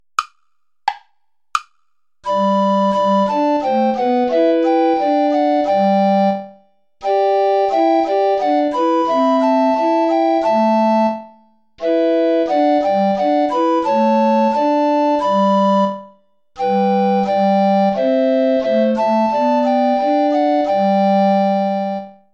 play along without tenor recorder; goudimel claude; sus serviteurs seigneur

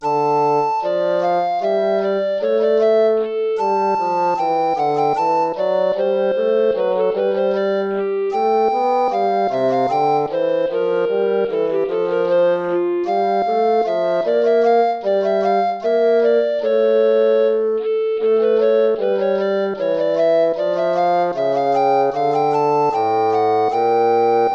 air, hornpipe

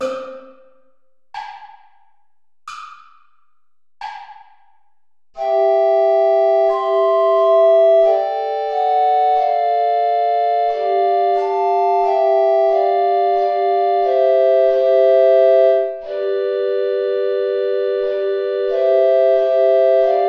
play along without bass recorder

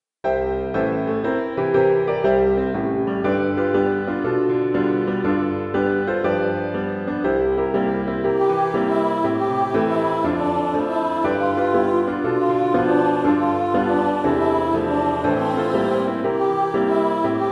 advanced piano; jingle all the way

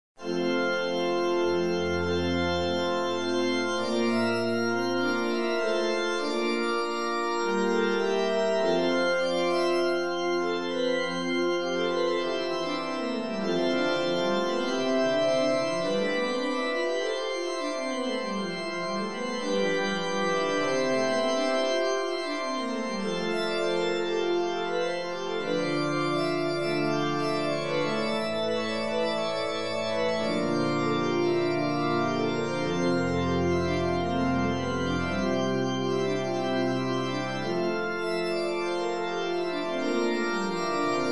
toccata german keyboard; tablature; xvii century; preserved biblioteca universitaria turin

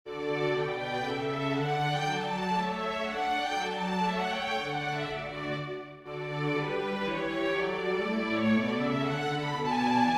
for winds, strings; Let our songs heav'n ascend; Deborah

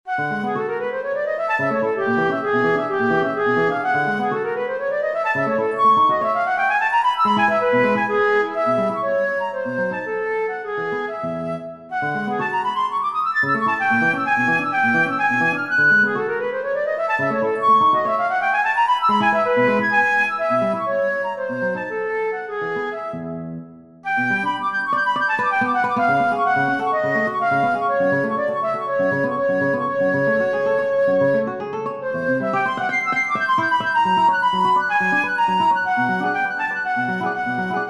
for flute guitar; grieg edvard; the butterfly from lyric pieces

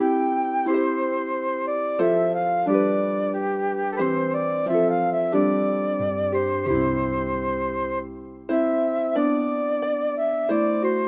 for flute harp

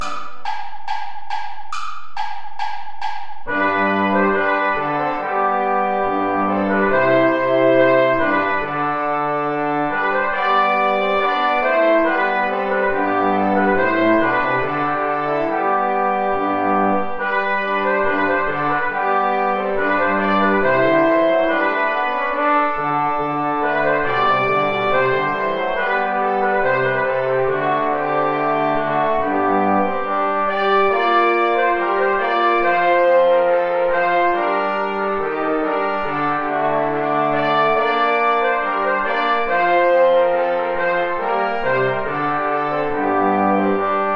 ballet play along without trumpet

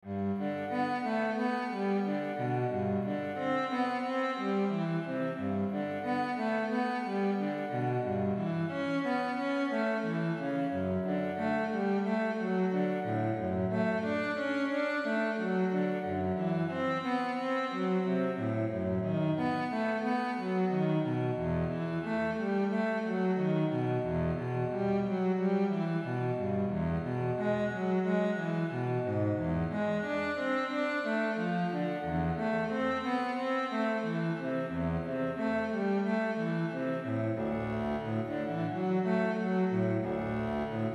cello solo

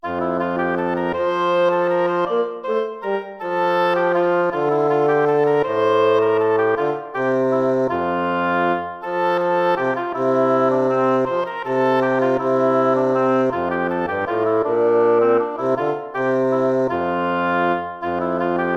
lute

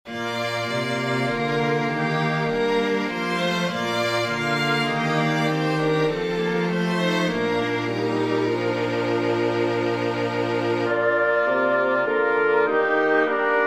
for winds strings

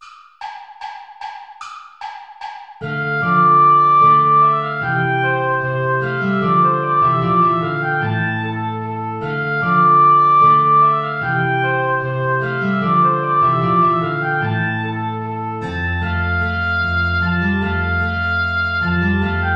bouree play along without guitar; praetorius michael

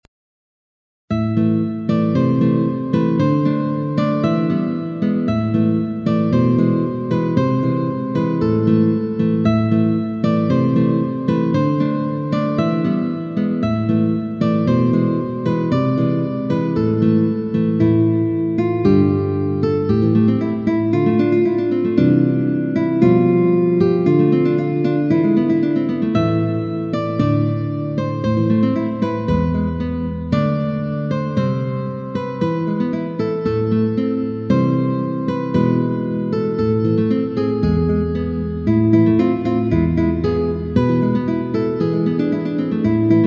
duet for classical guitar duet

